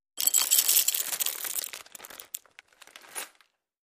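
Coffee Grinder 1; Coffee Beans Being Ground; Begins With A Short Grind, Followed By A Longer Grind, Then Concludes With Another Short Grind. Close Perspective. Cafe, Restaurant.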